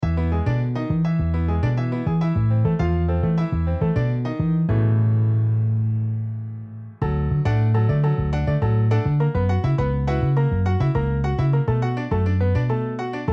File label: Piano Bass MIDI